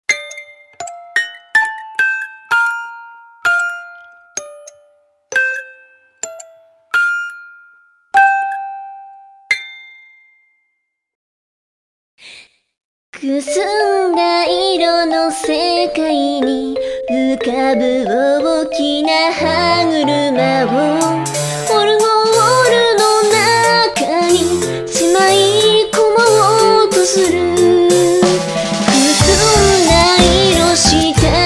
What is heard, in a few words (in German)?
orgel